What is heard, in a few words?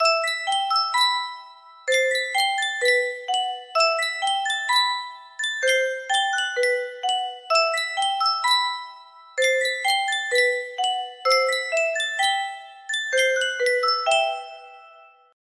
yunsheng music box